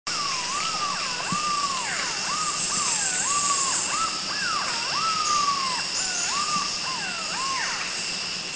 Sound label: Pompadour Green Pigeon (Philippine); Philippines